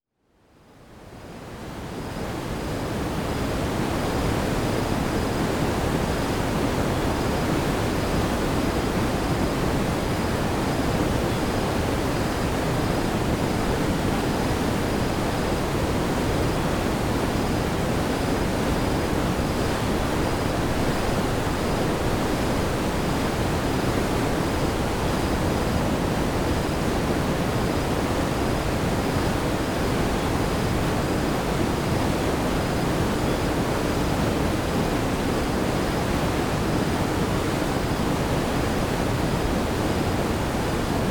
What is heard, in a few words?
Waterfall